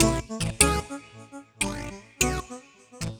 Synth Raveriff; Sonic Foundry (Sony Creative Software); Knutrix Drum & Bass

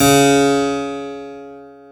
KEY HRPCR, Roland; Roland - LA Composer vol. 1

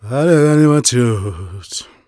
Vocal Chirp, African Voices

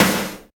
SNARE TEKNO; Roland L-CDX-01; Snares 2; Sn Modules 2

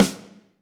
Snare, Roland TD-20

